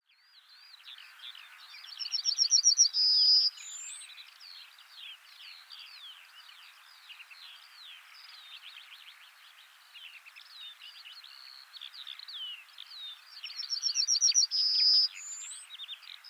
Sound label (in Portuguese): escrevedeira amarela; aves